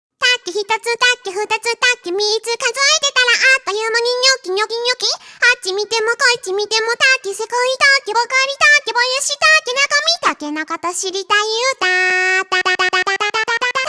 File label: Vo Main